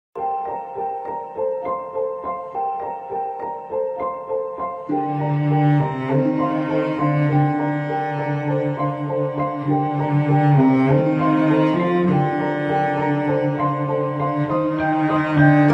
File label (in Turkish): Enstrümantal fon müzik Umutsuzluk